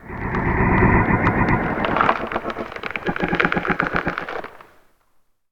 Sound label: Creak; Barotraumatic; NightmareCreatures; StationCreatures; Husksprinterold